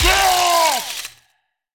yell; HeavenStudio; ringside

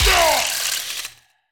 yell; HeavenStudio; ringside

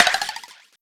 offbeat lightbulb out; HeavenStudio; karateman

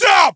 yell Raw; HeavenStudio; ringside

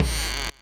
lean; HeavenStudio; frog Princess

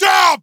yell Raw; HeavenStudio; ringside

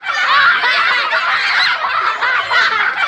kids laugh; HeavenStudio; ringside

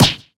combo hit; HeavenStudio; karateman

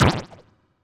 roll R; HeavenStudio; flipper Flop

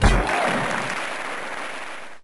rock Hit; HeavenStudio; karateman; full Nori

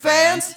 fans; HeavenStudio; ringside

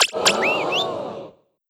success; HeavenStudio; firstContact

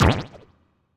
roll L; HeavenStudio; Flipper Flop

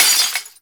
Break; HeavenStudio; workingDough

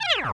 sigh; HeavenStudio; frog Hop

